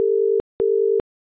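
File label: ringback tone British; i OS; iOS10; nano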